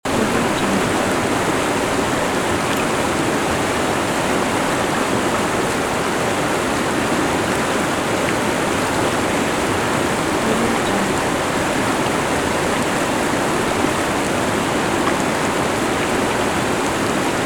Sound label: waterfall river